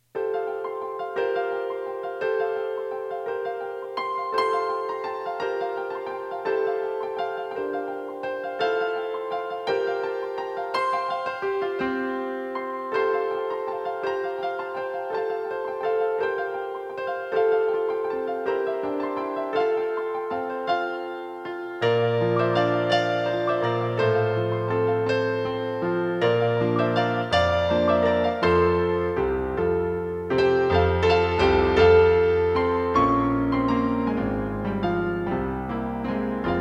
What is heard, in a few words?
Gesu Bambino piano duo